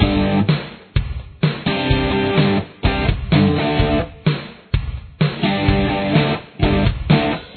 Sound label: verse guitar